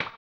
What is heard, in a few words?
HAMBONE, Vocal Blues Soul; Spectrasonics; Planet